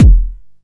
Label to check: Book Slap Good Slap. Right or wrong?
Wrong